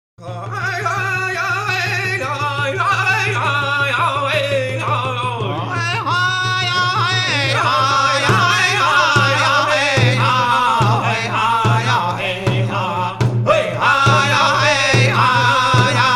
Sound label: Native American